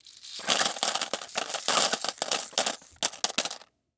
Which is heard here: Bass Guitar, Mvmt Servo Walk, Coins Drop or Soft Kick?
Coins Drop